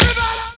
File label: LIVE CLAP; Univers Sons